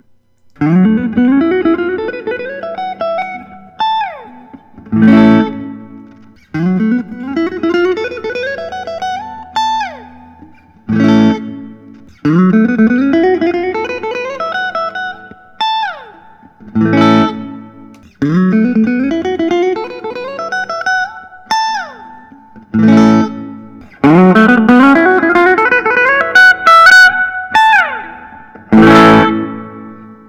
suhr standard arch top; natural gloss

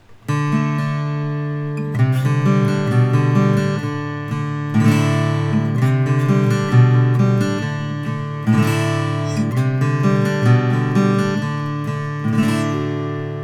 Martin custom 00 12 fret adirondac natural

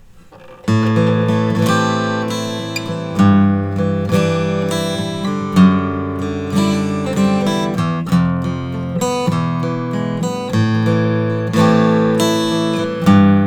mcpherson cmg 4 5 ziricote red

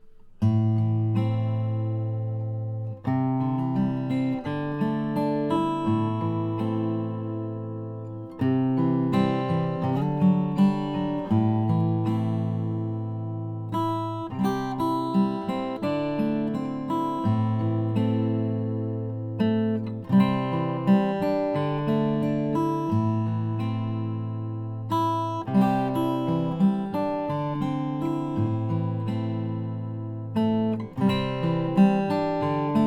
mossman grand prairie brazilian rosewood

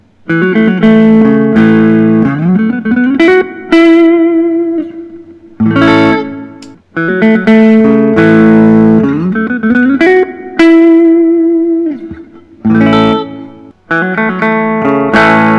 collings i 35 gold top

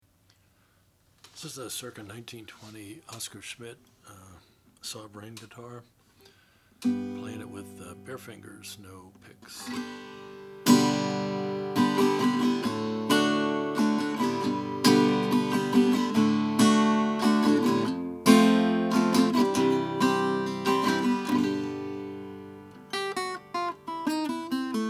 oscar schmidt sovereign natural

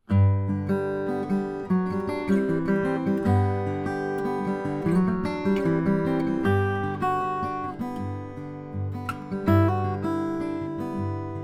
mcknight lowlander vintage walnut

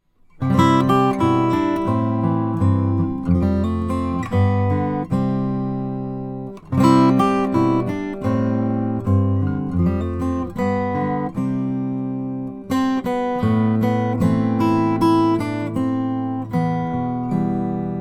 mcelroy standard claro walnut

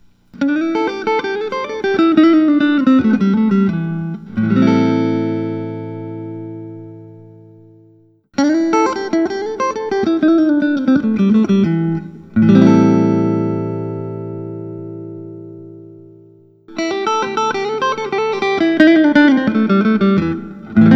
fender custom shop; dennis galuska

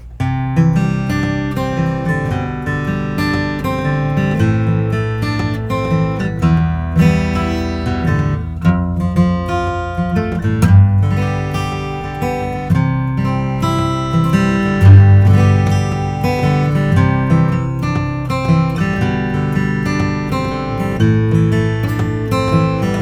martin custom 12 fret slope natural

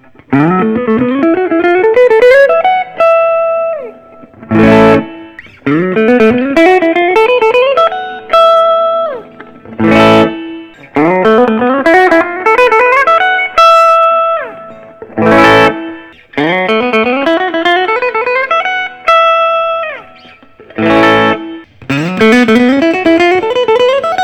parker fly classic pearl white

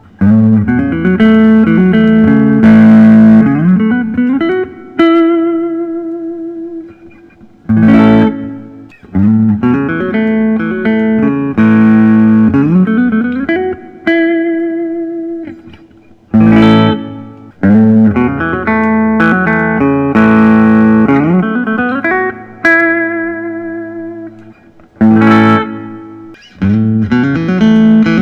Paul Reed Smith SC Hollowbody II; vintage

